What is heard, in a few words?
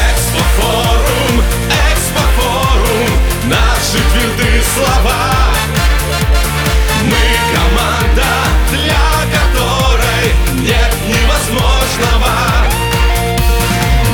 rington